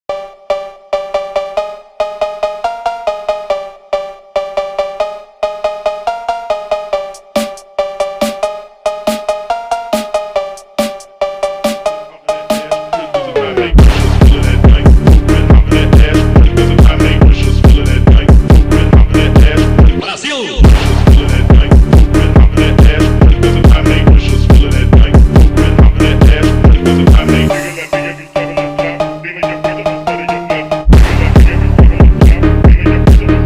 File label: Phonk